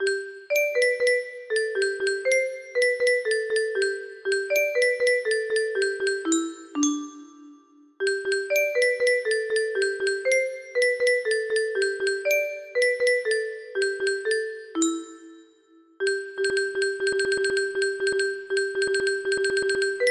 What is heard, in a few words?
music box